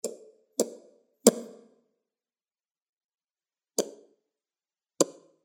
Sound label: L catta click, lemur; The Audible Phylogeny of Lemurs